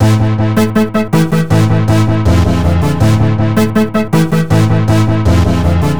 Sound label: synth (raveriff); Sonic Foundry (Sony Creative Software); Ugly Remnants VOL-2; Knutrix Demo